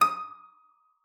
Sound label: SPINET D6; Xsample VOL-7